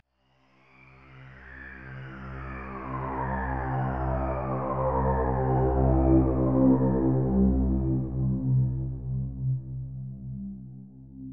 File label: Plutonium Pad